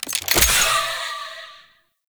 Rifle Reload, Weapons; Warframe; Zariman Grn Wraith Rifle

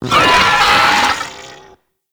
Moa Charge Scream; Warframe

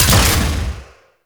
Layer Shot, Shotgun; Warframe; Weapons; Tenno; Revenant; Phantasma Prime

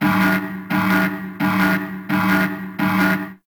Boss Warning; Warframe; Caliber Chicks Minigame; Transitions

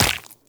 Run Flesh, Footsteps; Warframe; Tenno

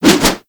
Axe Spin Swing; Warframe

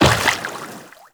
Step Water, Footsteps, Walk; Warframe; Characters; Tenno

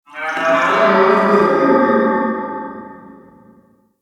Amb Corrupted Animal Distant; Ambience; Mendicant Area

